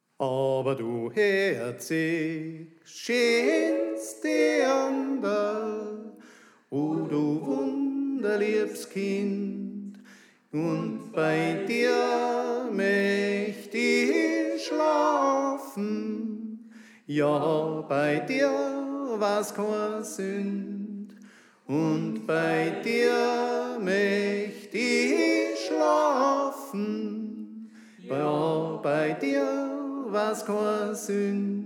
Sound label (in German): Tenor; u Herzigs Schöns Dianderl